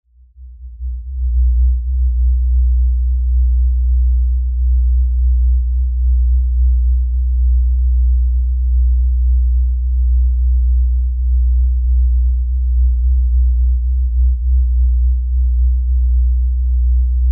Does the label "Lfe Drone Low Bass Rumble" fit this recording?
Yes